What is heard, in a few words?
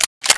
p90 mag in, weapons